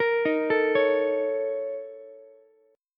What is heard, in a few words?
A sharp Minor Major 7th Double Flat 5th, A sharp, down, Ukulele; A#mM7bb5 arpeggiated; JGuitar